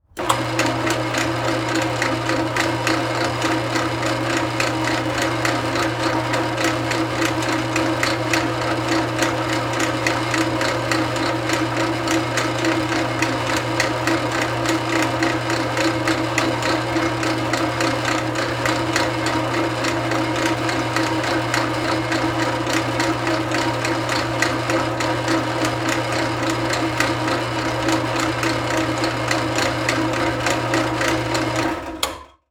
Industry Tool Drill Press Turn On And Off

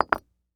Concrete Set On Concrete; Foley

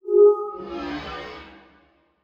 Transition Metallic Hit To Whoosh; Production Element

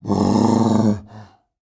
Animal Mammal Carnivore Walrus Grunt; animals; AdobeCC

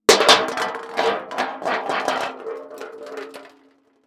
Hubcap Roll And Land; transportation

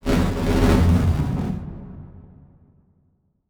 Transition Hit Impact Distorted; Production Element

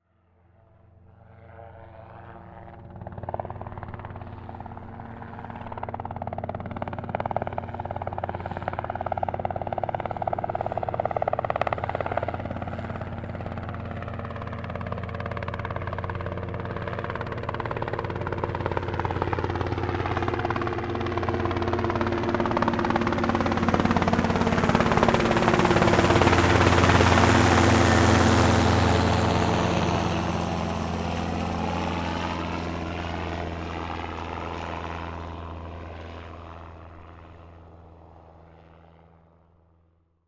Helicopter Fly By Slowly; transportation

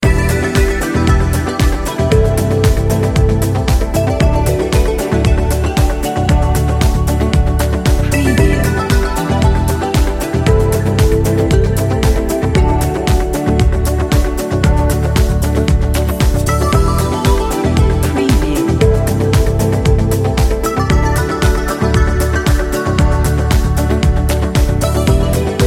Corporate inspiration loop; Motion Array

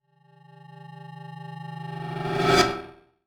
Title Transition Feedback Blast; Adobe; Production Element

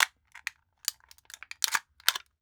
Household Can Soda Can Movements Crush

